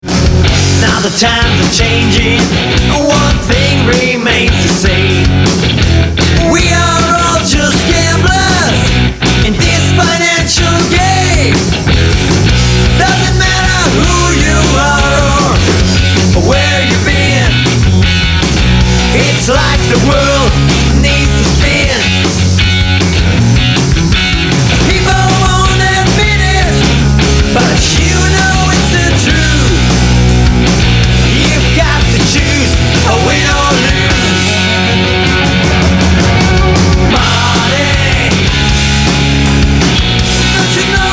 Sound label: Financial Distress Blues